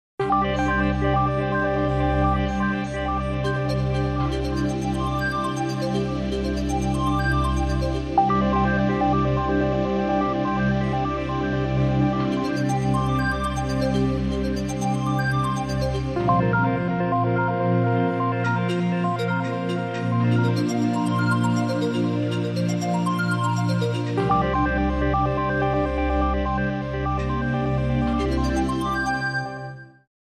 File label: Low Energy Light Scientific